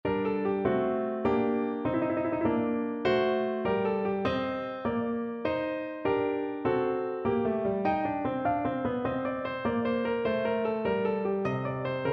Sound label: Minuet, piano; arne